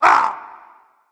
grunt hit dragon; fafnir

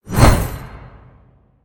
swing; smite; chaac

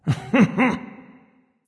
hehe; chronos

